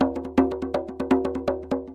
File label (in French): Djembé